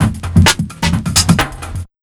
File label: HARD 130, Big Beat Drumloops; USB Soundscan vol.25; AKAI